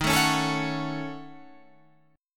E flat Minor 7th Flat 5th; strummed; chord; 11,12,11,14,14,14; JGuitar

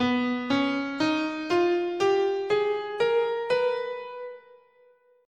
B Lydian Augmented, scale

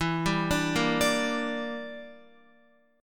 E Minor 7th Flat 5th E, Guitar; Em7b5 arpeggiated; x,7,7,7,10,10

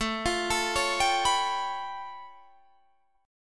A Augmented 9th A Guitar Open D

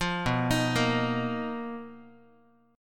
B Major 7th Flat 5th B down Guitar; arpeggiated

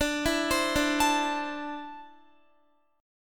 D 7th Suspended 2nd down Guitar; D7sus2; arpeggiated

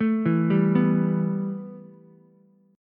A 7th Suspended 2nd A, Ukulele; A7sus2 arpeggiated

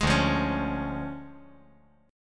D Minor Major 7th Flat 5th D

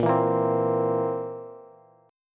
A sharp Suspended 2nd Flat 5th A sharp, Bass

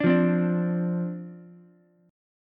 D-Minor 7th, D, Ukulele; 7,5,8,5; Dm7 strummed

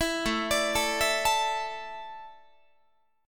A Suspended 4th, Guitar; Asus4; arpeggiated; chordshape; 19,17,17,19,20,20

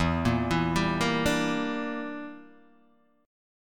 E Minor 7th Flat 5th E, Guitar DADGAD

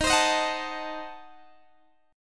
D sharp Diminished 7th D sharp Guitar Open G

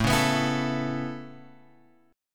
A Major Add 9th A, chord; Aadd9 Chord {5 4 X 4 5 5} strummed

